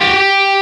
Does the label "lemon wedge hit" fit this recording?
No